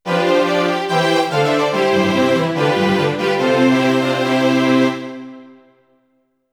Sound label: Name Register Finished; 2nd Place or Below; Ghouls 'n Ghosts; X68000